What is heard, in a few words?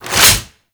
Flame Thrower Reload; Sargas Ruk